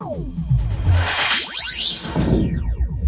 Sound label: flange slamdance; Weird and Interesting Hits